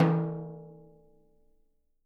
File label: Living Drums, JZ XTOM 1; Sampleheads; Peter Erskine Living Drums